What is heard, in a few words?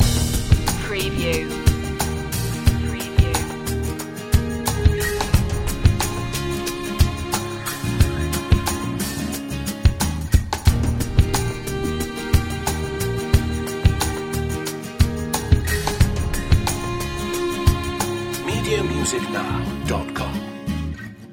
joyful summer loop